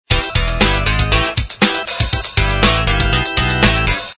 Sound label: Default Tone, Ringtones; Nokia 6206; VZW